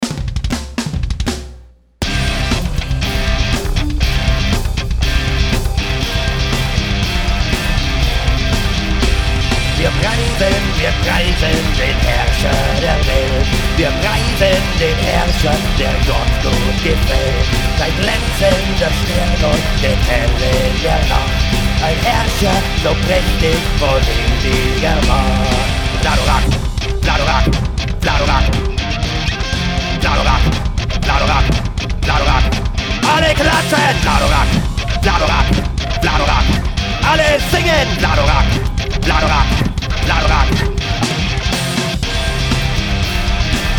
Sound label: Metal Version